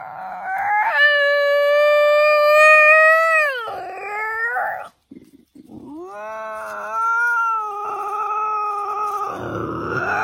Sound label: angry how